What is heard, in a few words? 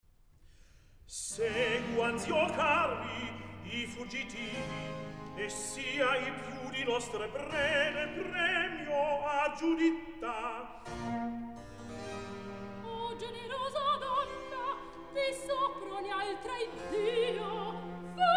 Recitativo; Mozart; Oratorios, Cantatas and Masonic Music; La Betulia liberata